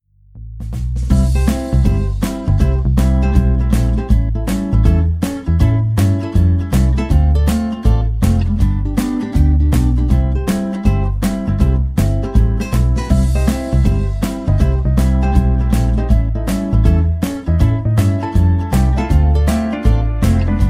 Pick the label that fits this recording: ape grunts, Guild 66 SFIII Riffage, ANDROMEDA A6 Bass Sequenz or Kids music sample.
Kids music sample